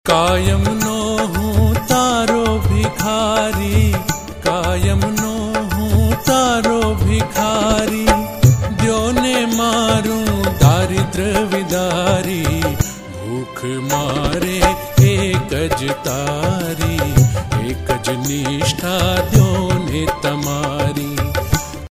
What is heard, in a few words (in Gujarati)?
Kayam No Hu Tari Bhikari Vocal